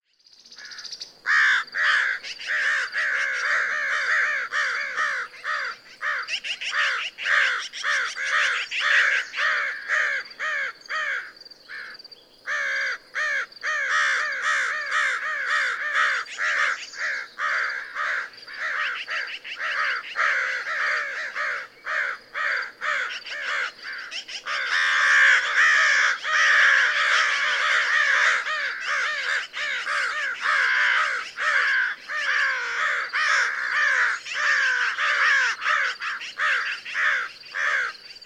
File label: American Crow